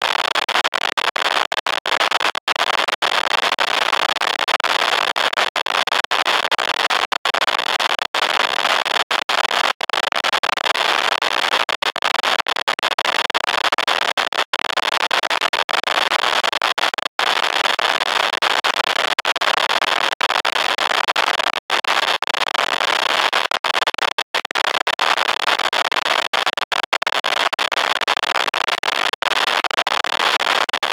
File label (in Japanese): ノイズ バリバリ